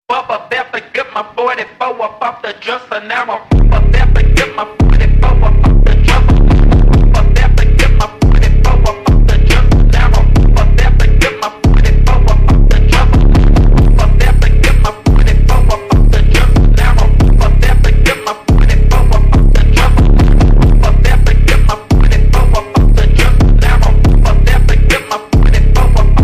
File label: phonk slowed reverb